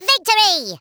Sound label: Worms victory